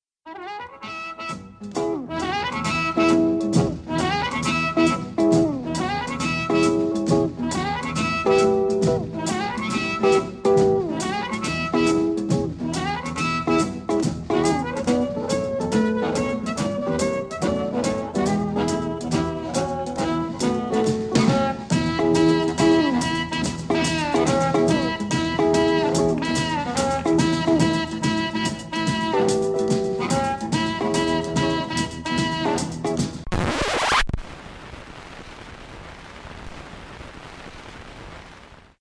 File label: Juke Scratch